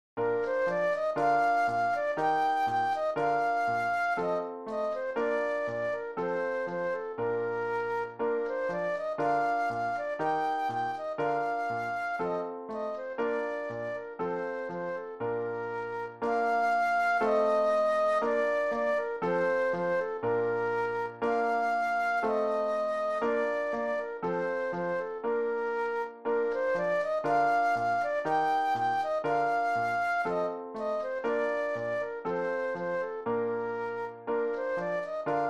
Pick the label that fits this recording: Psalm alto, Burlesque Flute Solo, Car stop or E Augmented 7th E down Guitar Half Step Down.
Burlesque Flute Solo